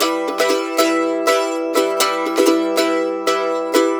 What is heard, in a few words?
Blues Guitar riff; ILIO; Fretworks; Blues Guitar Samples; CEE RIFF